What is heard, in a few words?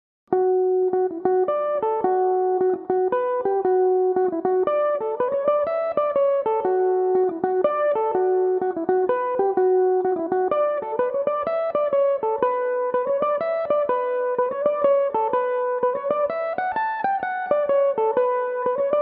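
b minor, mandolin; The Empty Cave; Belmuse EM-190 mandolin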